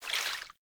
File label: Vial Foley; Warframe; Warframes; Alchemist